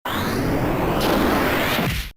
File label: special attack